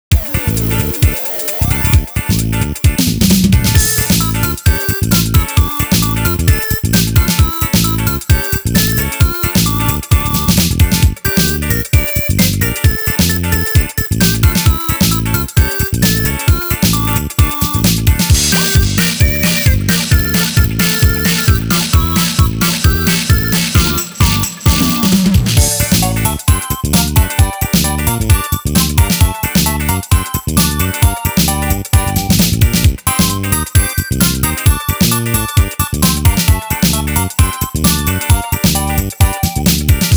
Troika (Russian Folk Medley); Taiko no Tatsujin 8 2006; PS2

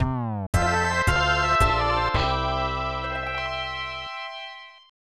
Game Set; extra innings hakunetsu professional baseball ganba league; snes

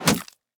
bullet hit

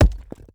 masonry hit

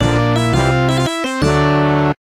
Victory!; Underhero

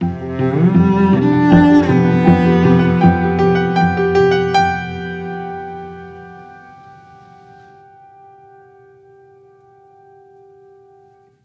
Music Level Discovery Ending stinger Mix

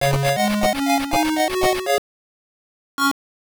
End Level; Asterix; Master System

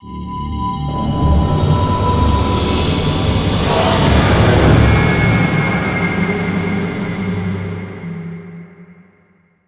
Mission Start; fatal frame 20th anniversary celebration soundtrack

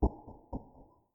footsteps; Five Nights at Treasure Island 2020 Remake

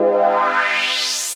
Beat

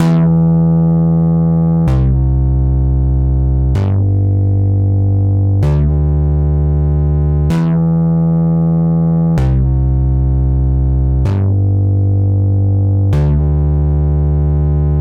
Poly bass; super crush k.o.

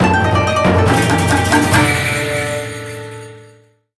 reward; Shuriken and Aliens

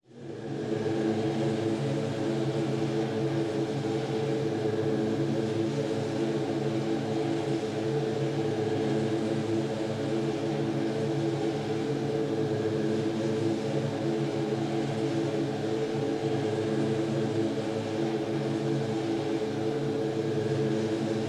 ambience; prelevel 2

